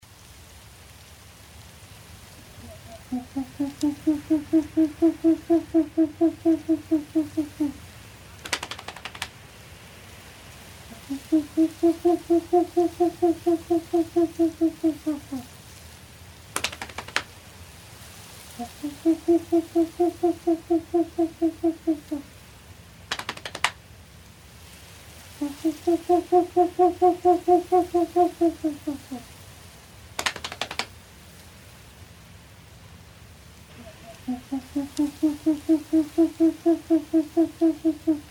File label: short eared owl